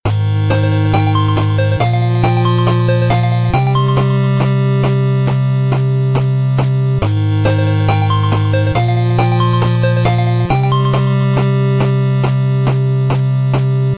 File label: BGM MENU LP 8bit; Mighty No. 9; 8-bit soundtrack